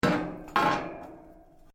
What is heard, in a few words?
METAL ON METAL; Metal (Sound Effects:Materials)